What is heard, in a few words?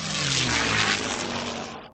plane ramp; sfx